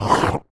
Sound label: squid hurt; Minetest; VoxeLibre; mobs mc